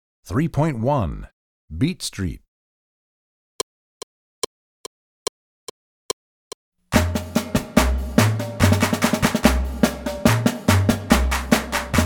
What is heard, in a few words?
Beat Street, Percussion; Percussion Book 2